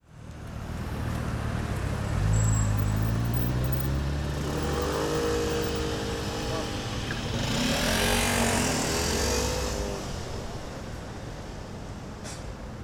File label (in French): rue; olympus ls-10